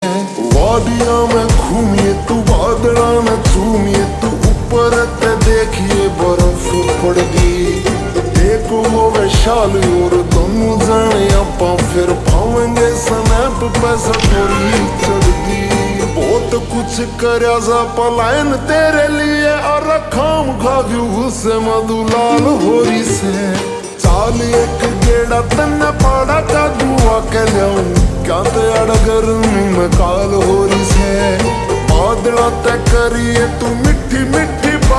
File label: Slow Reverb Version Ringtone